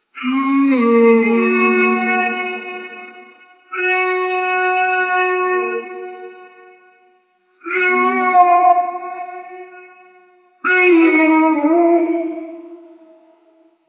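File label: alien creature is friendly, greetings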